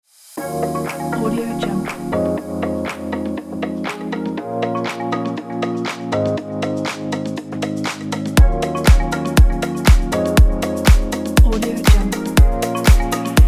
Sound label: fashion lounge house; audiotekmusic